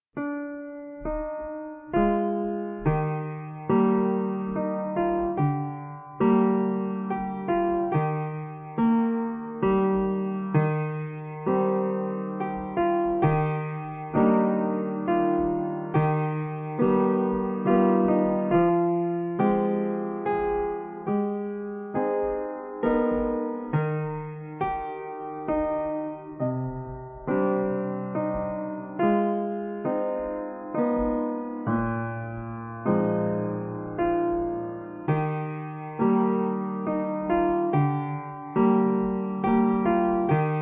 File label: instrumental